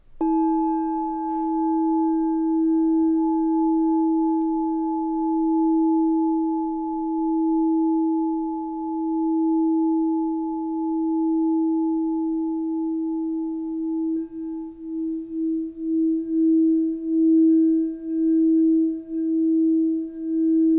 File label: TACA 432 E4